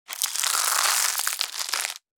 Baby dragon egg hatching

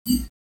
HUD futuristic button confirm

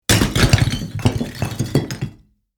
Big blocks structure collapse on bricks and rocks

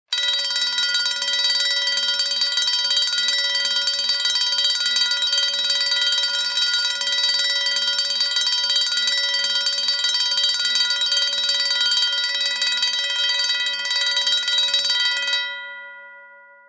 Service elevator alarm bell

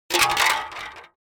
Cartoon accent crash broken metal object

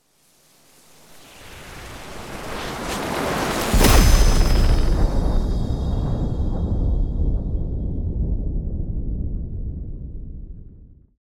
Magic explosion huge magic spell hit fantasy

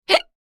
Adult female hiccup

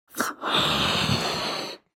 Blowing up a rubber balloon from mouth

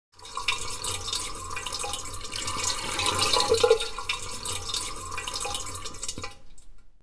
Toilet water refilling after flush

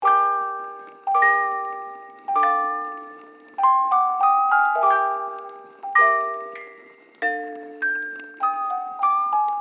Horror music box distortion loop

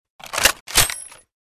Sniper rifle 50 cal reload

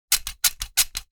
Silicone sealant gun repeated trigger presses; hand tool

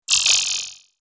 Futuristic digital switch turn off

